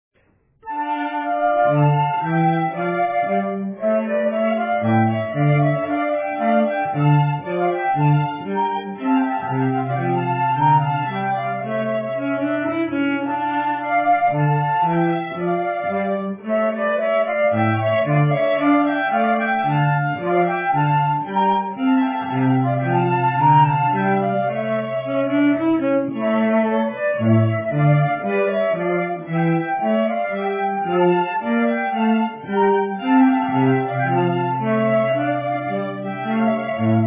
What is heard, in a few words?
Fireworks